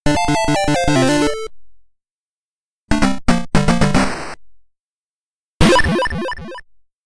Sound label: Unused Jingle, Unused Jingle, Unused Jingle